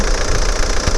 opel astra, vehicles

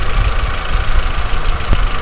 solenza, Vehicles, Dacia

